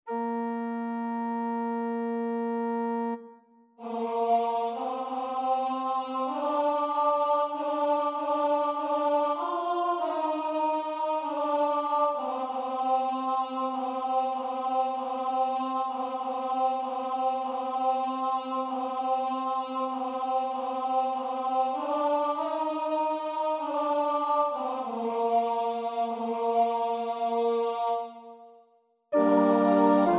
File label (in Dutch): alt, koorpartij oefening; Dubois (Théodore); Messe de la Délivrance